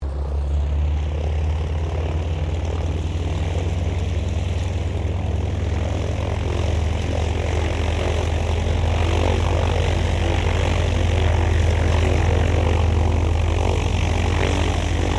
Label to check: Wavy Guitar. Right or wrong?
Wrong